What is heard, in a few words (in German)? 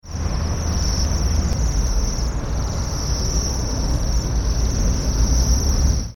seidenschwanz; Voegel